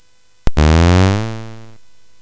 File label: Space Gun